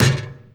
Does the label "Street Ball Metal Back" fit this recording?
Yes